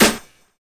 Sizzly Snare Sound F# Key, steel snare drum sample tuned to the F# note